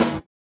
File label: SWUSH; Spectrasonics; Metamorphosis